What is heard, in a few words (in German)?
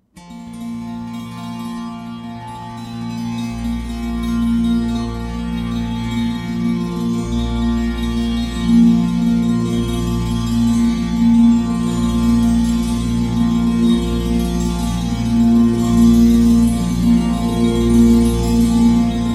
Körpertambura gewölbt, Stimmung f-b-b-B (BB), dynamische Spielweise; Körpertambura® (Body Tambura)